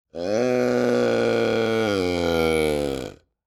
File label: Sports Buck Caller Call Long